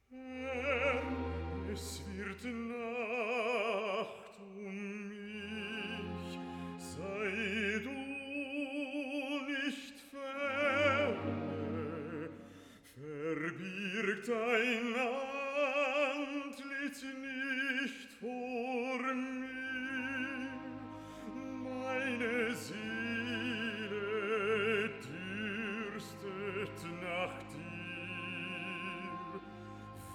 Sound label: Recitative (Baritone, Soprano); Mendelssohn; Herr, es wird Nacht um mich